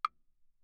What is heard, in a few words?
granite block, mf; Percussion; hand percussion; graniteblocks